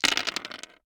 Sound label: DICE on Hard Wood, Throw and Roll, Standard, 5 Five Dice; Samples; ArticulatedSounds; DICE Sfx Party (Extended)